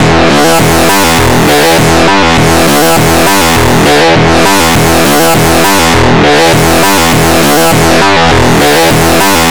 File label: Sequence, Drums & Drones